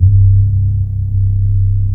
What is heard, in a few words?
OLDIX C2, BASS; Best Service Dream Experience